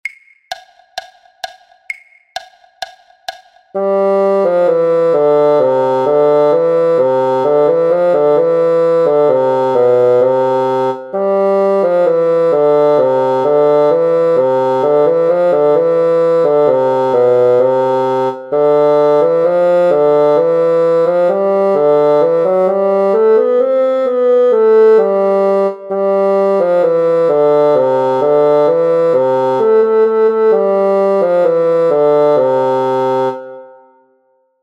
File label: BASSOON; Easy Bassoon Christmas Carols Book One